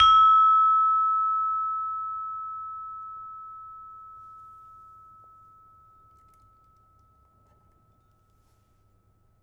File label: Peking hard, gamelan